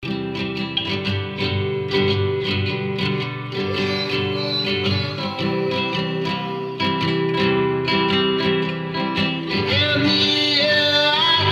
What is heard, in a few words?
Lush Reverberations And Flamenco Vibes